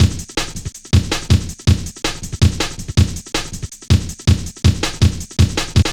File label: boom, Drum Loops; Jungle; Boom 161.5; Zero-G Jungle Warfare Complete